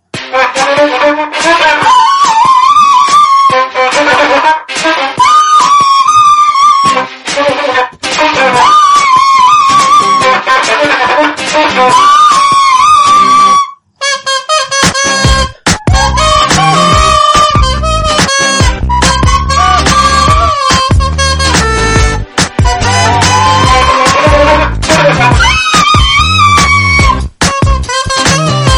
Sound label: shitty flute